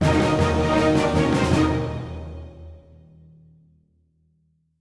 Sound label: Music Fight Cold Theme Hard 160bpm End; asterix obelix slap them all 2